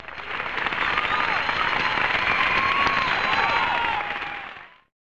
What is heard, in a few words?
Applause